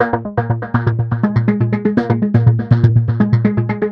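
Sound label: 122 BPM Sequence A major G minor Tonic F; innovative sequences 1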